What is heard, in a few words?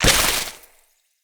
Grunt Death Cabbage Growth; Enemy; Corrupted Sproutfolk; Combat